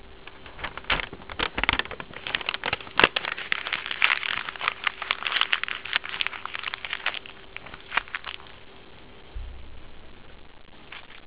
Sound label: paper, crackling; SimScience